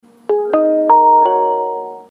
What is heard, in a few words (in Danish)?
s-tog jingle ny